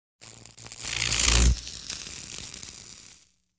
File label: Звуки Электричества The Sound Of Electricity